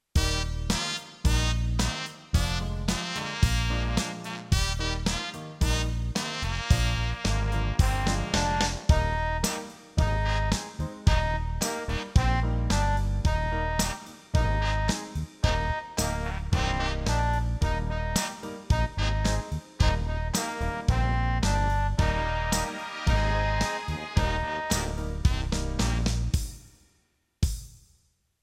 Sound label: Miss Mary in Bb